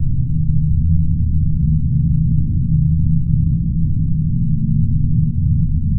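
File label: room tone dusty low filter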